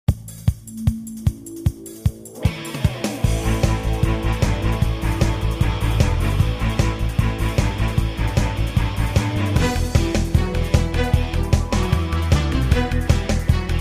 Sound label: boss fight; mosquito